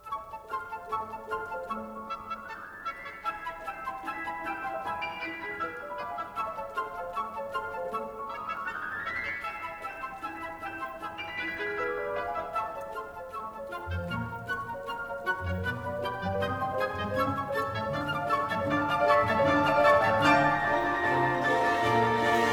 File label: Piano Concerto; Liszt; Piano Concerto No2 S125; Kempff